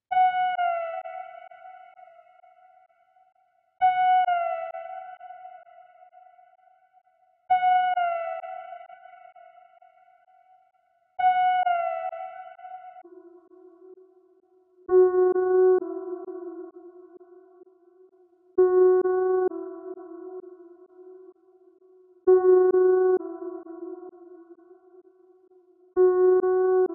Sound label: Wail, 130 BPM D# Min; Melodies; Loop Stems; Cymatics; 2022 Melody Mystery Expansion; Good And Evil